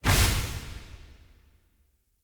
Circade Trick Ghost Despawn Burst, Enemy Abilities